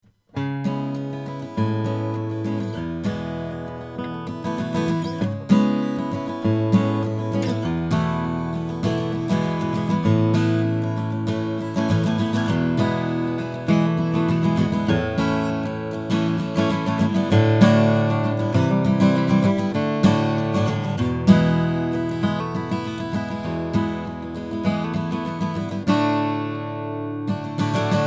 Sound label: martin d 28 indian rosewood